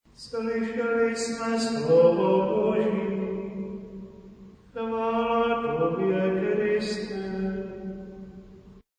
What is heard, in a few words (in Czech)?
po evangeliu choral